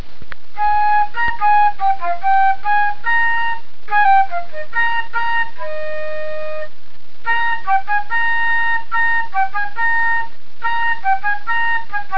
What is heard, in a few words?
flute